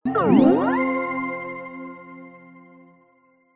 multimedia game tone short positive bonus win glide synth